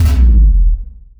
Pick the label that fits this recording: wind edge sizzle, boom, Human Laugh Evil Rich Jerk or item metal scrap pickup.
boom